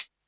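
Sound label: closed hi-hat; eddyflux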